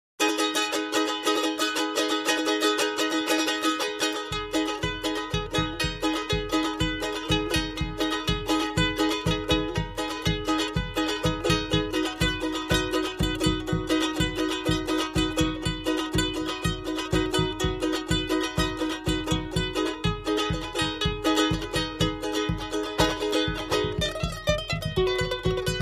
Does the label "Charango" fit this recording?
Yes